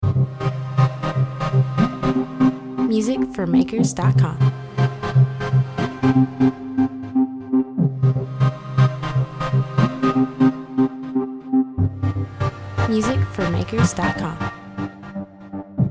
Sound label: step one overdub